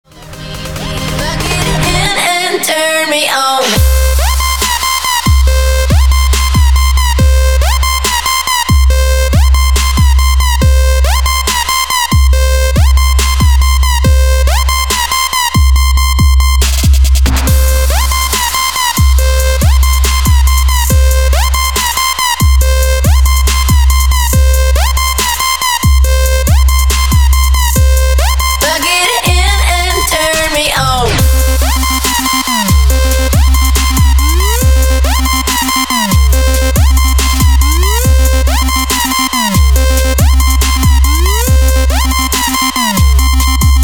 Trap Remix

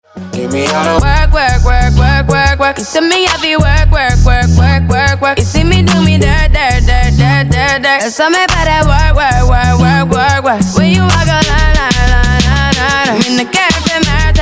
drums

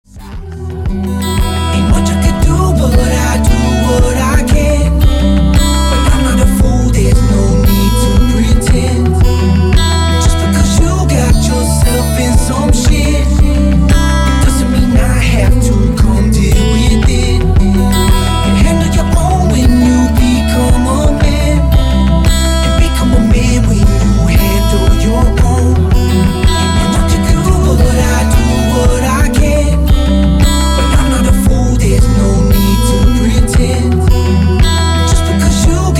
bass boosted

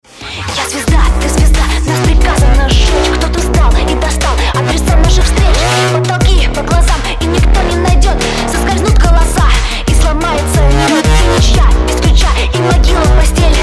dubstep mix

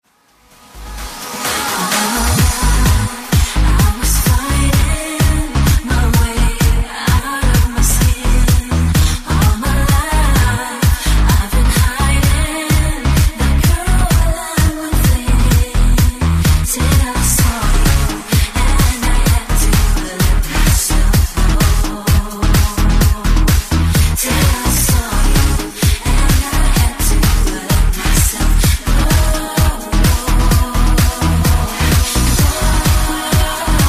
vocal trance mix

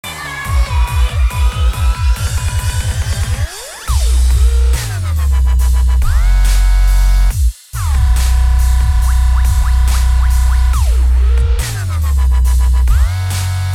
dubstep remix

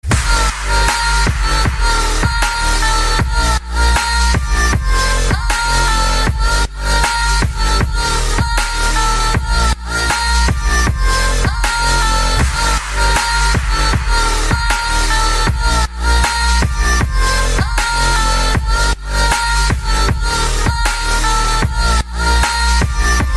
k pop

